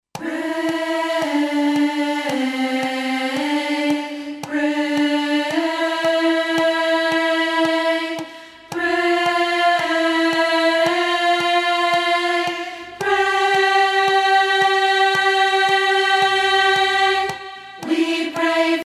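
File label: alto bridge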